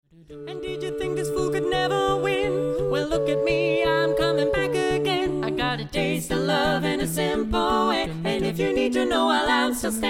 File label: female